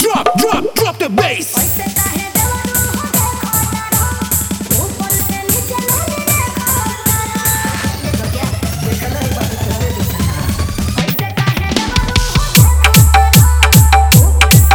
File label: Bhojpuri Dj Remix Songs Edm Trance Mix